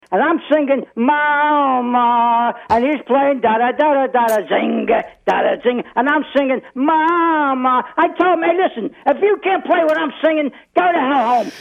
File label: Singing Like A Crooner